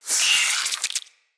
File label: Root Spider Vocal Pain; Animals; Spiders; Vocals